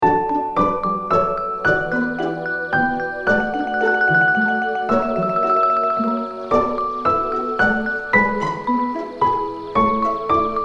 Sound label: Cute Weather